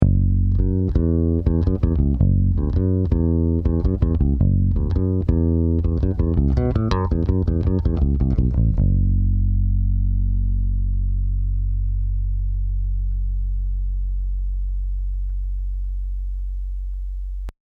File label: Bass 10 Treble 0